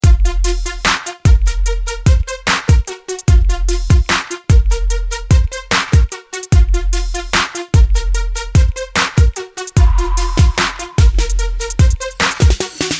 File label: encounter thug; Manamon Music; Manamon 2